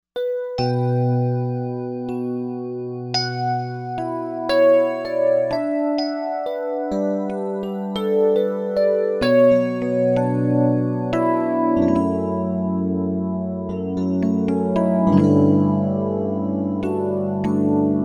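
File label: Piano Chorus Bell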